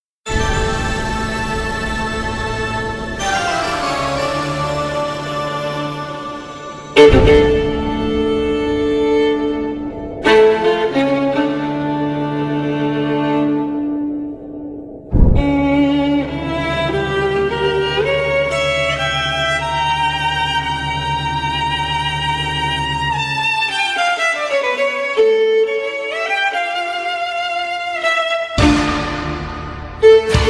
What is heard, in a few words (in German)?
violine klassik